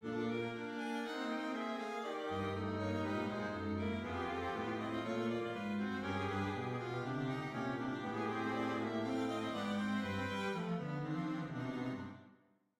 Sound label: fugue; analyse de la fugue en ut mineur de mozart